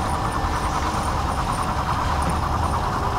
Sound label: chains; Limbo; perception; gamesoundopedia